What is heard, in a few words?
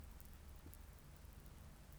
pulses, BALAENOPTERA; MONACO 2022-07